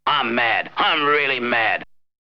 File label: Vocal; ZERO G - Datafile DISC 1